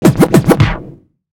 LONG SCRATCH, Vinyl Scratches

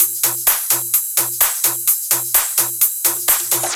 Drum Loop Full ohne BD; 128BPM; Multi Loops

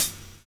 PHASE CYM; Univers Sons

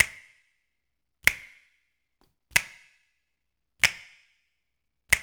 HMM, 93; Spectrasonics - Supreme Beats - World Dance

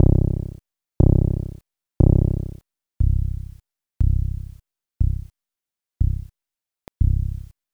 Moog bass; AMG - Vince Clarke Lucky Bastard

